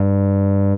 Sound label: sweepy, synths; Notes